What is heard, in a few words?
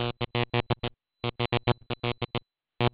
NEON